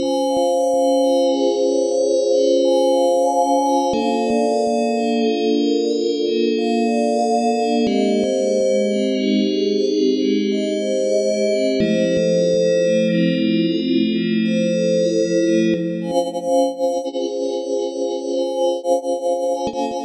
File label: Grainy Pad; Rerun